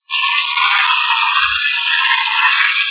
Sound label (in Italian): infarto; Castello Monselice